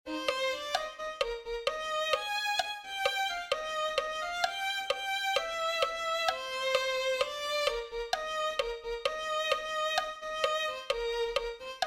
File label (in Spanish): mi corazon se fortalece en ti violin